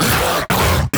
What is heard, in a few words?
bear effort; LoL; annie base